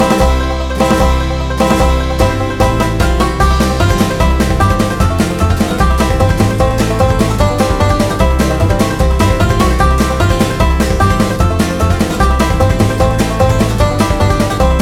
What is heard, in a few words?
Country; Serious Sam 4